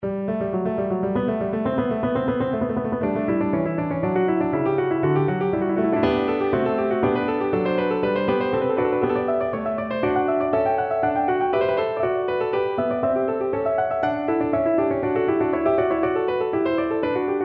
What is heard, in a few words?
prelude G, piano